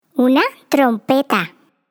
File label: TRUMPET